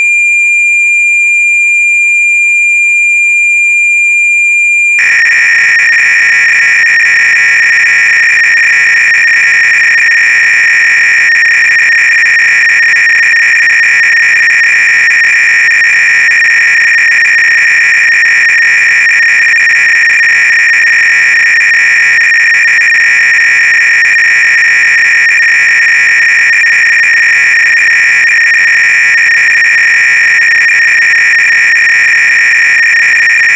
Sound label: BASIC GAME BATTLE CLOADC, Audio Cassette Programs; MITS; Altair